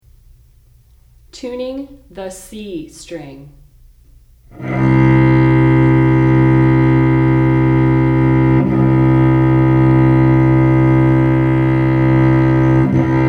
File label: Tuning C String, cello; Tuning the C String